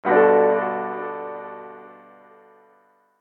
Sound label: warm piano, notifications; HTC EVO 4G LTE